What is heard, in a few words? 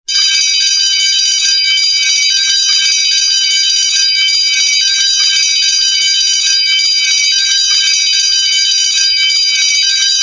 Bells Jangle